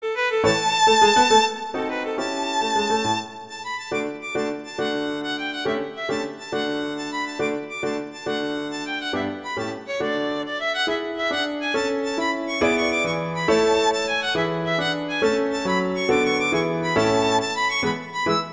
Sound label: Allegro; Seitz; Tarantella